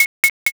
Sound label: scissors